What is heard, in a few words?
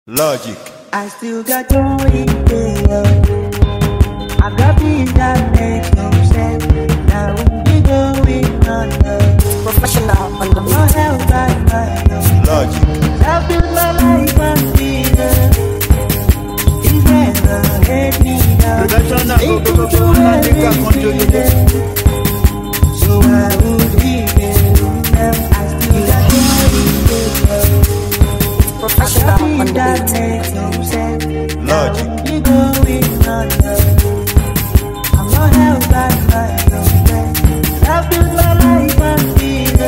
Speedup Mara Beat